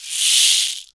SHEKE, Afro Shakers, Shekeres

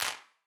Wider Clap

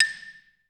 XY LONG A5, Advanced Orchestra; Best Service; Peter Siedlaczek's Advanced Orchestra

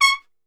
C# 4 PIC SHT, Brass; Best Service; Brass Super Section

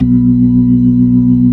B-3, Jazz; Roland LCDP10 Keys of the 60s and 70s 2; Jazz Ch 16

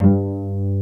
CELLO PIZZ; SOLO PIZZ ST; Greytsounds VOL-1

